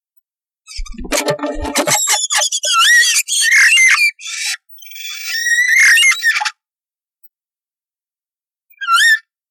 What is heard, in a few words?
starlings